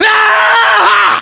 melee charge; english

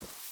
footstep boots grass run; Rust

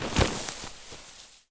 bodydrop grass low; Escape from Tarkov

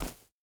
boar footstep grass hard; Rust

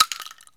12cal shell concrete; Escape from Tarkov

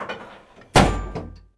Vehicle Hatches; Red Orchestra Ostfront 41-45

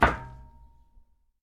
run metal; Escape from Tarkov

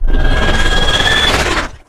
dragon scream

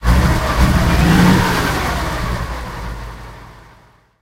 m18 engine load, tanks; War Thunder

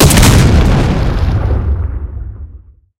tanks explosion; War Thunder

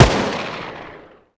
mg 1shot 3d far

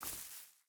footstep hide grass walk; Rust

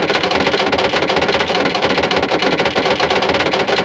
engine damaged; World of Tanks Blitz

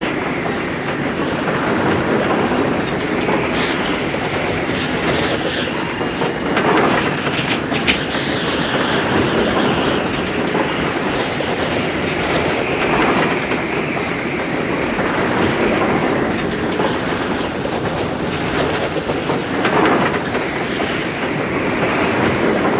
ambient airplane

